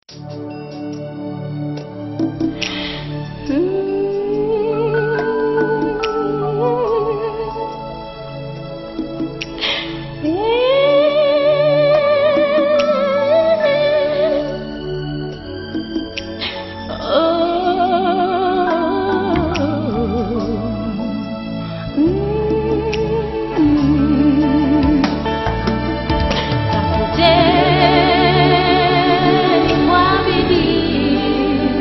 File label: Choir; AIC Makongoro Vijana Choir